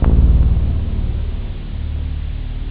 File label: Bass brass; Goldsmith; jazz jackrabbit 2; M8